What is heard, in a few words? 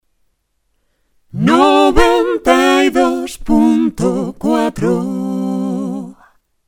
dial jingle radio two singers